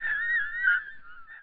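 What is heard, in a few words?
Special Photo Barcode Forest Environment Bird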